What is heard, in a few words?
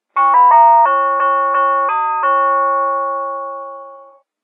yellow rose of texas, door chimes